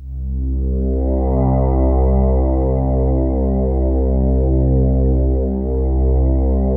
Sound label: DENSE FL, PADS; Masterbits; Vintage Voltage